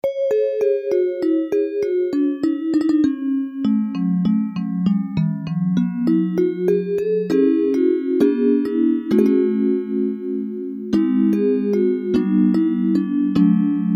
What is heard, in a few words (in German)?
lied schlusslied